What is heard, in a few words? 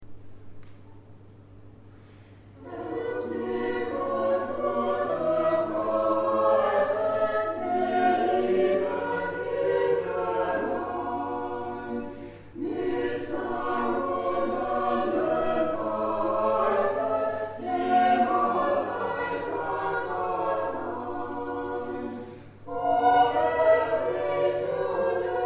Helft mir Chor; Orgelbuchlein